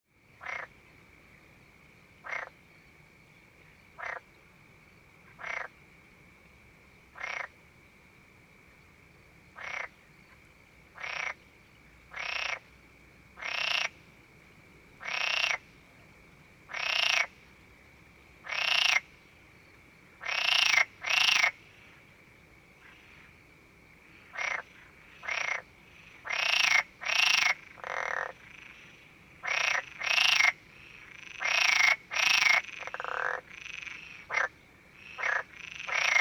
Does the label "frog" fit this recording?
Yes